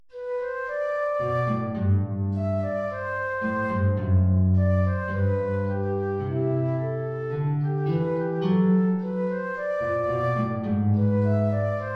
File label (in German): Andantino (Querflöte & Gitarre)